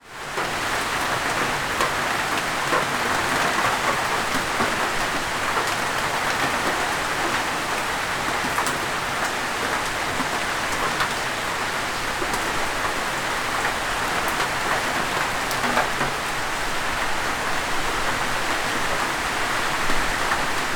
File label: nature rain and drips from trees on plastic verandah roof